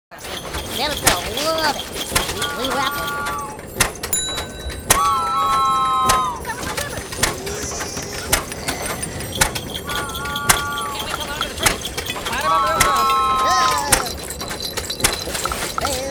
Santa claus workshop elves preparing gifts factory